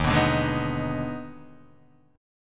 D sharp Major 7th Suspended 4th Sharp 5th, Guitar, Half Step Down